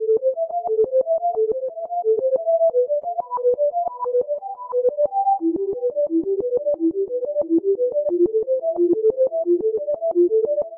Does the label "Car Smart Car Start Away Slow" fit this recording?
No